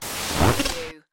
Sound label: Scifi Production Element; HoverTron